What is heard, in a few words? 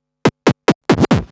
Scratch Fills 140BPM